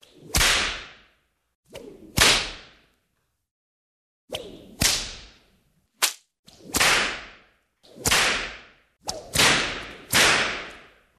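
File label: whip lash strikes; udari knutom pletyu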